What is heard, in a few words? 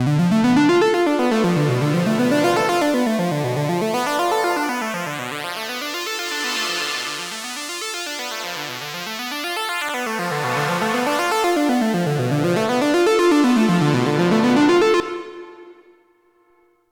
Erica Synths Black Hole DSP2 Synth Verb feedback size CV